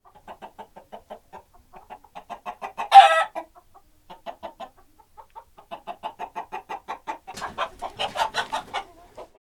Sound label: Animals farm chickens clucking hens and roosters